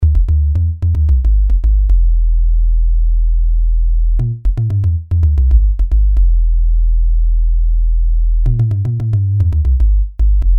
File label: CS bass, Yamaha CS1x CS2x